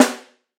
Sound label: verby snare drum sound c key, Royality free acoustic snare tuned to the C note.